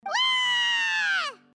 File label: waaaaahhhh; paimon